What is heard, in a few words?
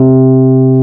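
PIANO C2 p 73, Rhodes, 73 Suitcase; Keyboards of The 60's and 70's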